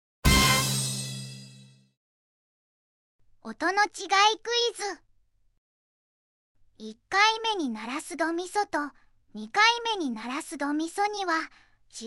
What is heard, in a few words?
quiz domiso